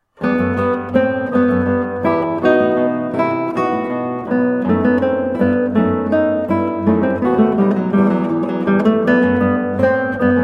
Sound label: Siciliana, guitar, spoken; Partita 5 in E Minor TWV41 E1; Georg Philip Telemann